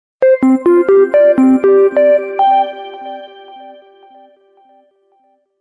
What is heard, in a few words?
Business, notifications; ICE Advant Edge 3G